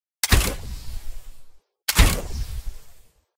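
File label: crossbow shots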